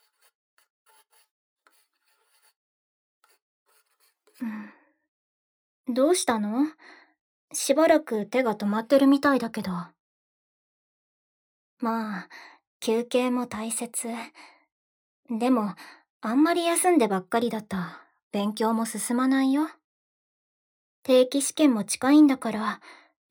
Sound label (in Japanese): 耳かき, ASMR; 02 SE控えめ; 仕方ないから耳かきだけだよ